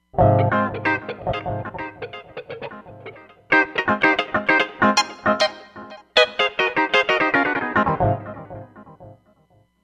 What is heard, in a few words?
Scratchee; Roland Fantom XR